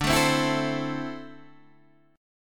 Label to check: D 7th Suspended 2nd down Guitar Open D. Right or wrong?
Right